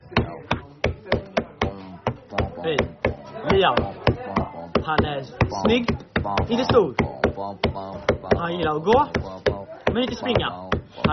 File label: rap